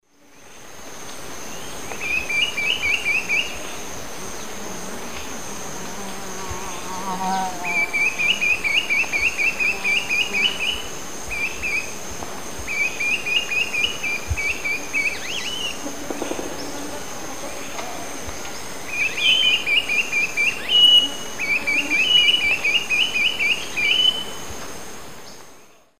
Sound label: Accipiter superciliosus, calls pair; S. America; Eastern Lowlands